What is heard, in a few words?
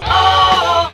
"oh" sung